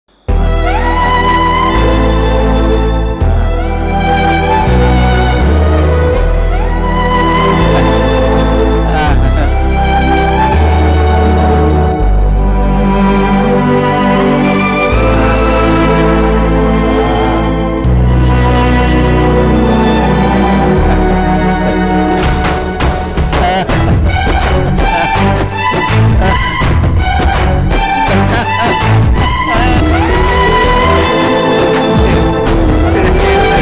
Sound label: kannada heart touching bgm, emotional feeling bgm; dance; ramachari movie